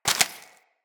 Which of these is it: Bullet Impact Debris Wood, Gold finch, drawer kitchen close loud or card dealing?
Bullet Impact Debris Wood